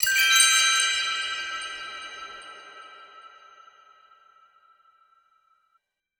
small win; netent

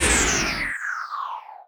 near win cooldown; netent

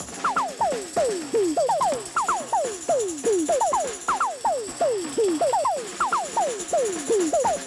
music loop drum fill; netent

